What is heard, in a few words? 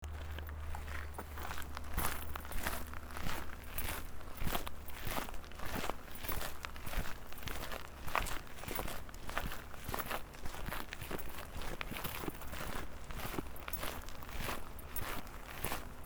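cemetery foot approach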